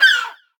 Beach Seagull; Zombie Catchers

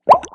Fizz Bubble; Candy Crush Soda

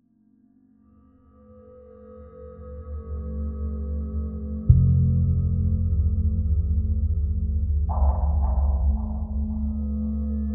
Tension Low; The Last of Us; AB Tension 5 Low Block

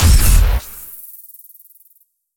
Sci-Fi Weapons-Sniper Rifle Single Shot

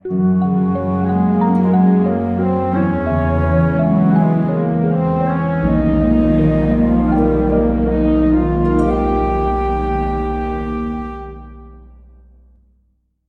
Level Intro; Aquanos

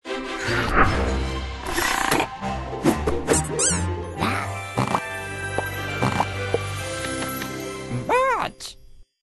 Splash Screen; My Little Pony World; android; BudgeSplashScreen

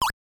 Mario - Luigi Skidding; Mario Bros.; NES

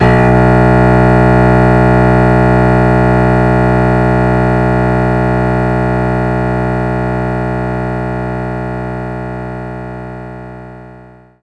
piano B1

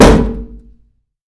dumb metallic hit

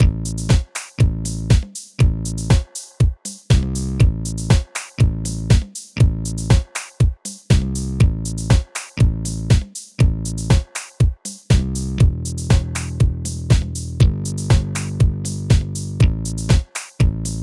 Music Disco; Disco Pants

